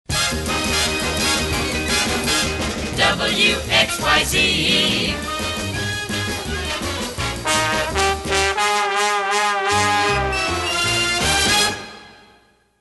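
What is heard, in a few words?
W X Y Z Instrumental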